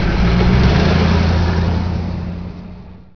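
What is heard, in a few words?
car drive; Microsoft Graphics Studio; Home Publishing 99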